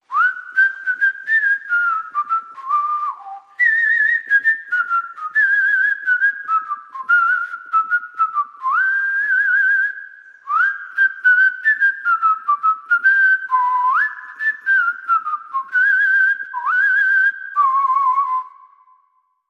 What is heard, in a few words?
Whistling Along